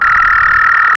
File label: futuristic whirling synth